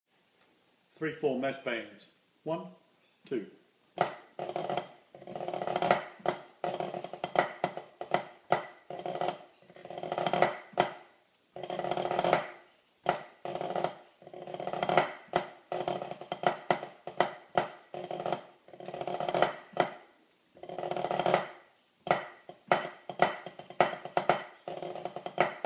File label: Massed Band 3/4, pipe band